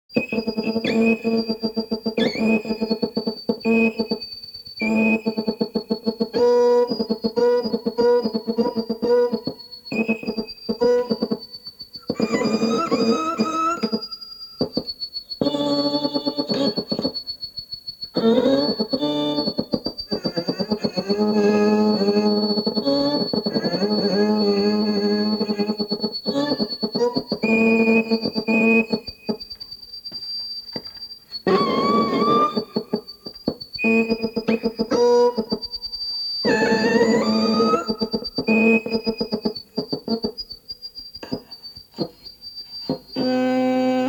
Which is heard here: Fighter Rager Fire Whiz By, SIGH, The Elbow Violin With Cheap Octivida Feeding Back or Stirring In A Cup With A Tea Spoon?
The Elbow Violin With Cheap Octivida Feeding Back